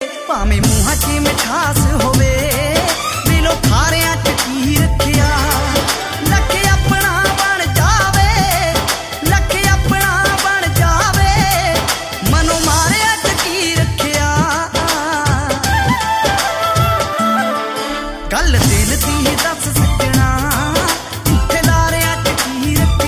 Punjabi Remix Tones

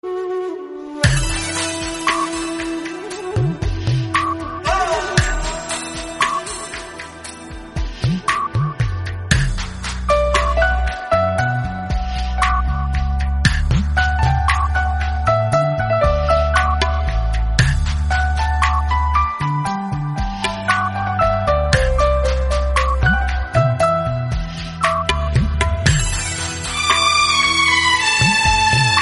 sad ringtone, Arabic Ringtones

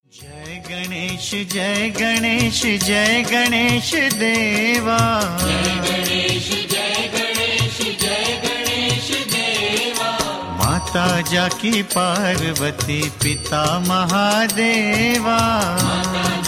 Devotional Ringtones; Jai Ganesh Deva; Lord Ganesha Ringtones

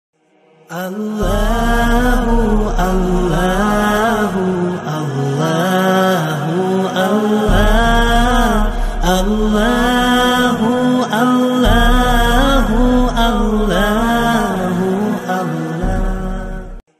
Islamic Ringtone

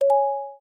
Video Stop, ui; Galaxy Note 9 Ringtones

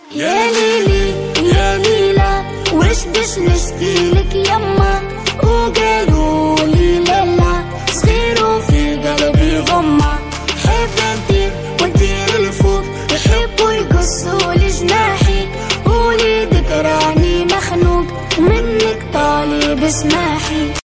Arabic Ringtones

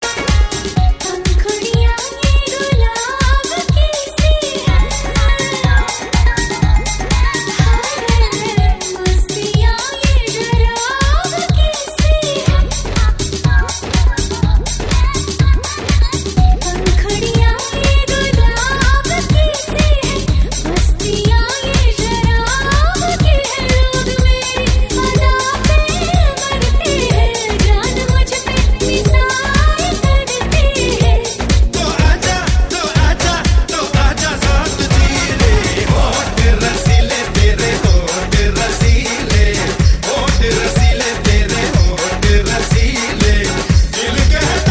Hindi Remix Tones; WEL COME; Hindi Movie

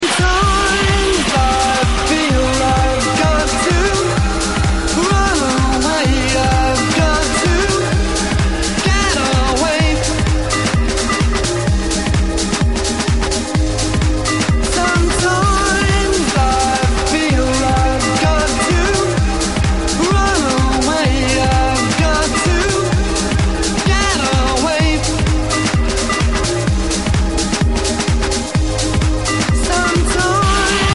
Warped Bass; club ringtones

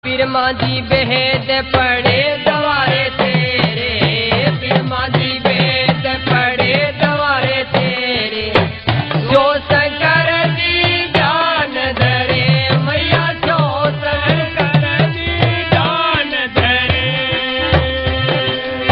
aarti maa kali; Navratri Special

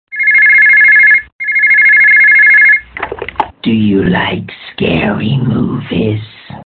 scary movies, funny ringtones

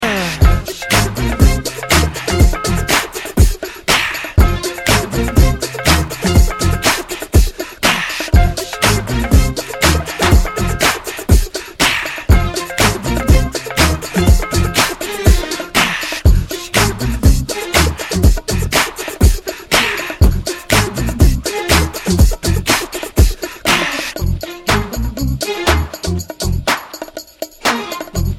Hi Fi Realtone

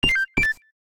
Pen det alert, ui; Galaxy S9

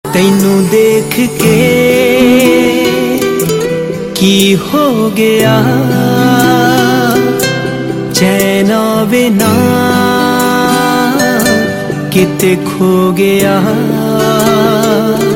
Punjabi Sad Ringtone

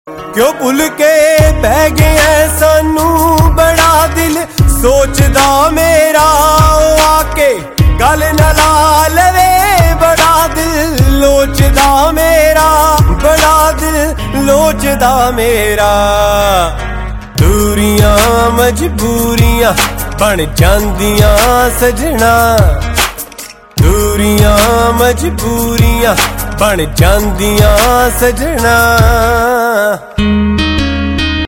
Punjabi New Sad Tones

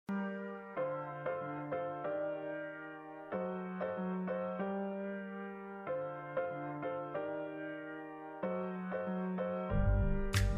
iPhone 10 Ringtone; Iphone Ringtones

